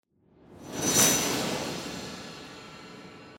Rise Hit

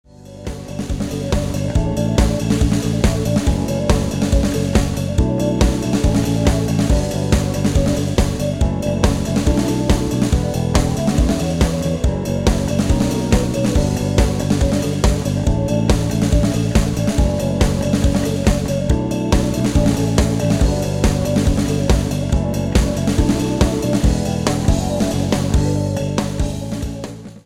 No Guitar

